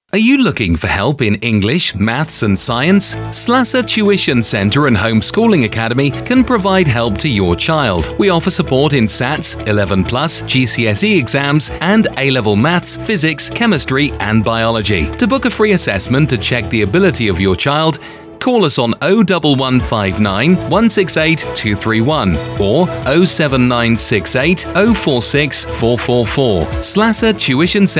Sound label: Salsa ad